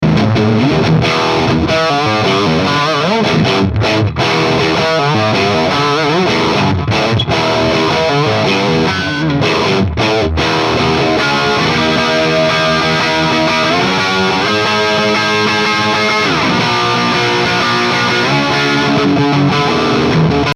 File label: greenbacks jcm800 sm57